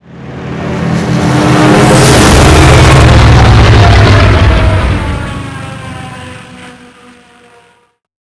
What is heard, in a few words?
flyby; tobruk